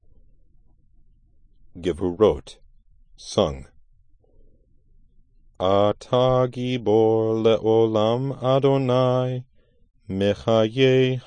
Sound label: gvurot sung